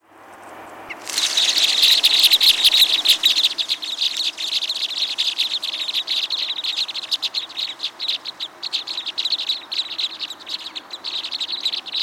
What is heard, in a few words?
Type N07 - AC, Crossbills